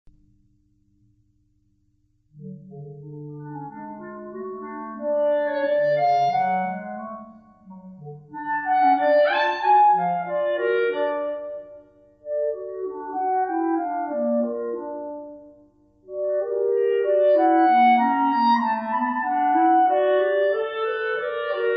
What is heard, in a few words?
divertimenti 2 clarinets; 5 divertimenti